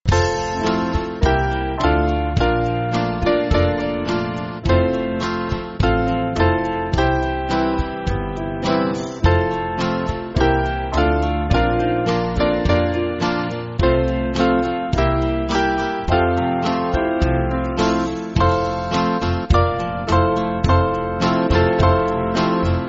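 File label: Band, Backing Files for Churches; Bethany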